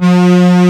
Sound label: V STR C2, PROPHET 5; Greytsounds VOL-1